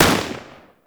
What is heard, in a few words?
Fire, Rifle; Warframe; Weapons; Sentinel; Deth Machine Rifle